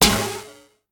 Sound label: Bounce; smite; raijin